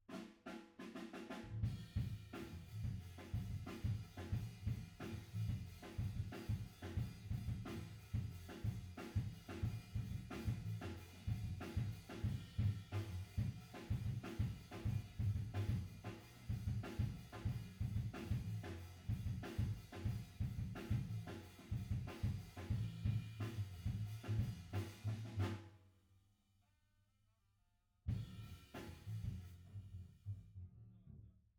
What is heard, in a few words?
Piano B